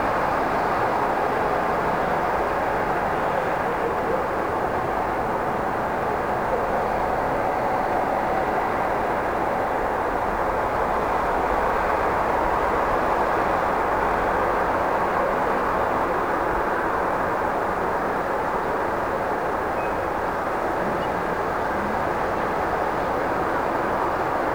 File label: Stockholm day, Ambience; Project Gotham Racing 2